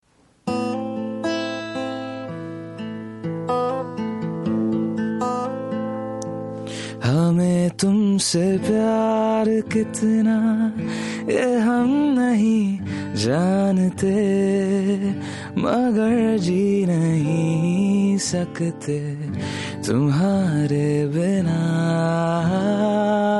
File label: Unplugged Version